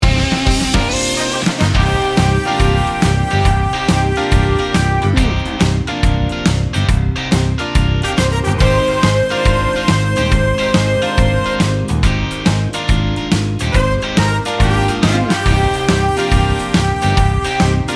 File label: majestic sports rock